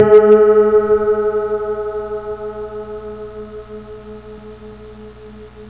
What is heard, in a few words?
a3 piano, notes played from a piano; piano on campus